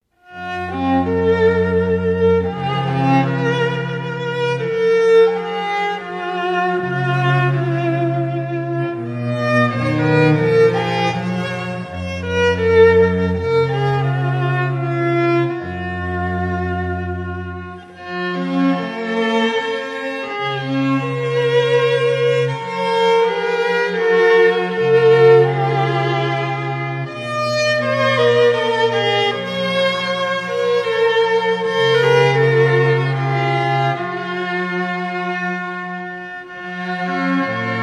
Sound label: dona nobis pacem latin hymn